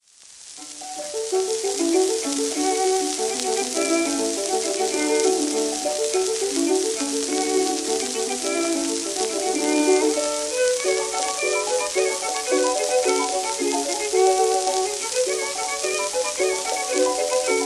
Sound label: gavotte